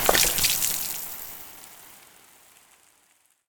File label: liquid; teardown; ps5 windows xbox series xs